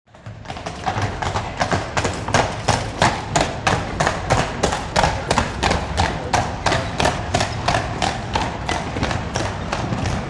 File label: By Horse Carriage Up P